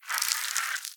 Egg Shake Louder; Devour; The Inn; devour 2021 gamerip pc macos

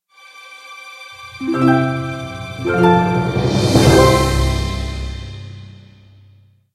Stinger; AA3 StarfishGroundReveal; club penguin island; android

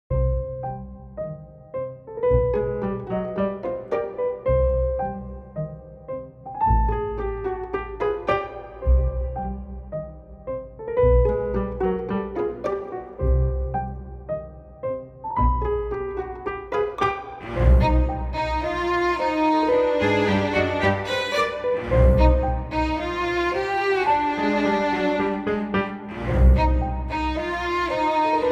Quirky Tango; APM

